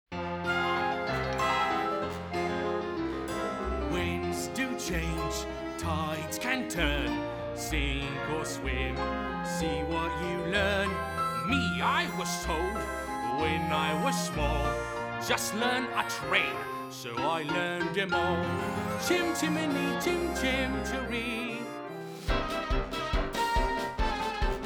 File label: song with vocals; stagelight